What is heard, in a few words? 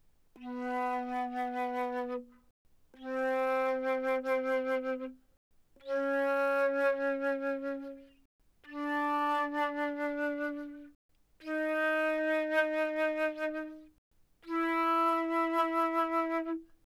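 Flute vibrato ff B3 B4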